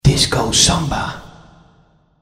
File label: samba